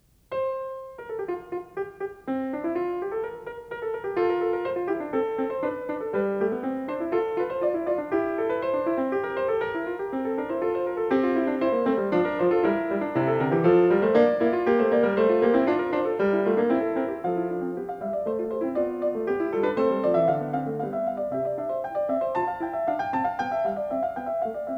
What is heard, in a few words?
Keyboard Suite; Handel; Keyboard Suite No2 HWV427; Heidsieck